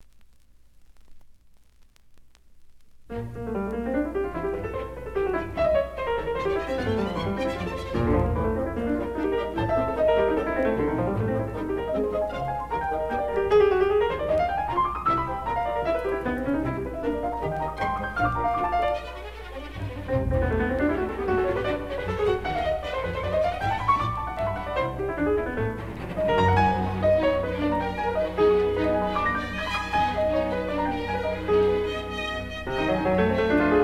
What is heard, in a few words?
Concerto No. 4 For Piano And Orchestra Vivace